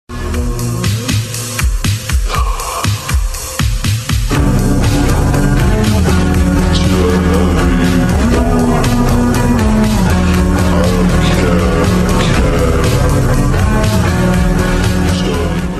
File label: sad music